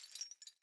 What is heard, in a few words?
Grunt Foley Movement Chains; Sinecure; Melee Grunt